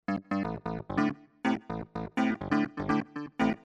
Clavinova

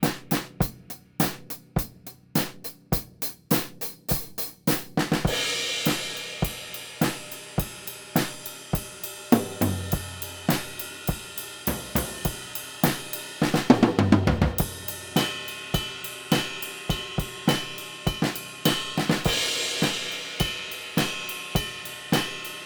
Drums Front of Kit Small Room; Sanken CU-51

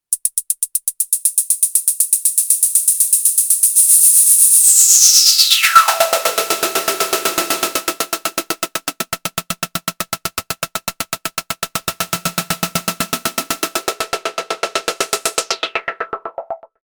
hihat

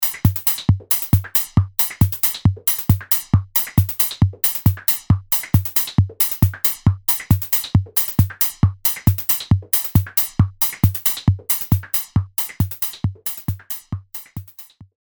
Clavia Nord Modular 4

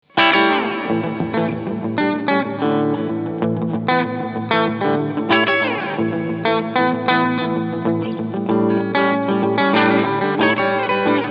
Hall Plate

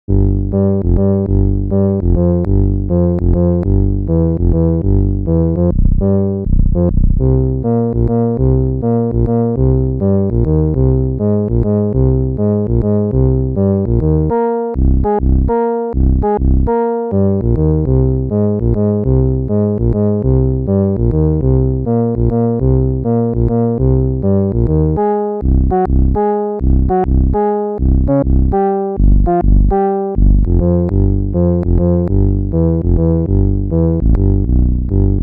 Organ Mojo Bass; StringBox

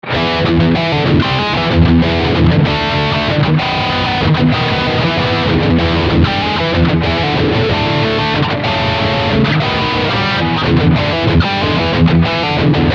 Power Drive

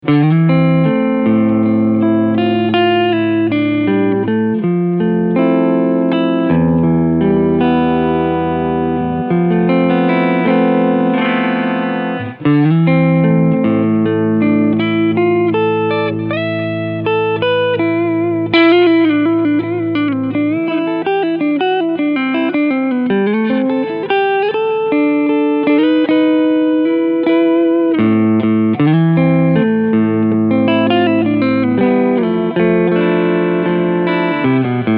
Cleansound Front PU

